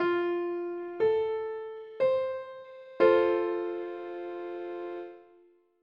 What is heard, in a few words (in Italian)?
Triade maggiore